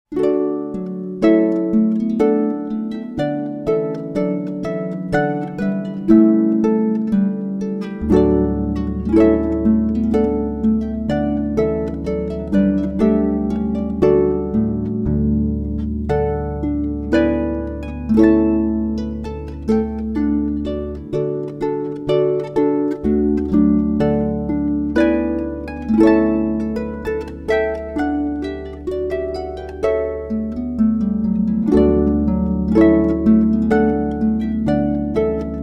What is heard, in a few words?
Trumpet Voluntary; Clarke